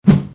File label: basket